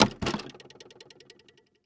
Electronic Machine Wood Record Player Opening Fast; AdobeCC